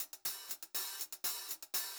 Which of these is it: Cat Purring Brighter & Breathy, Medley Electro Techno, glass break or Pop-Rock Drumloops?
Pop-Rock Drumloops